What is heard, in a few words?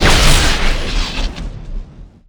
Explo Energy Fireball; Destruction